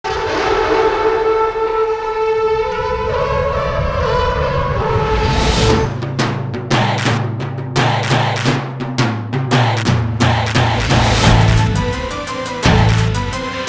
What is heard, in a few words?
instrumental version